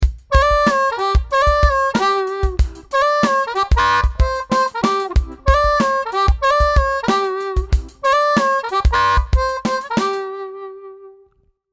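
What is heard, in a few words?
riff, harp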